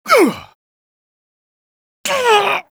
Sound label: terracotta front land